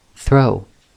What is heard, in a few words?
throw